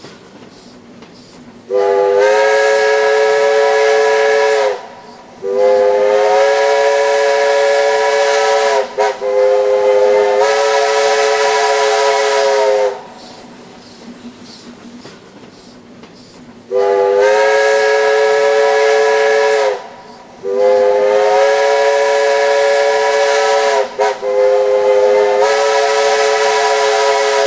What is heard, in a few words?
Whistle 2X